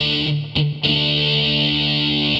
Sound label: GUITAR, ROCK POP; MAGIX